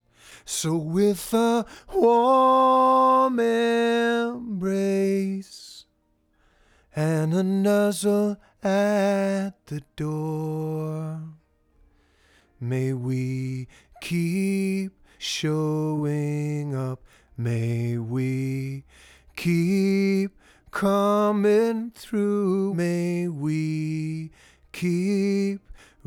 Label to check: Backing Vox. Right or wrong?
Right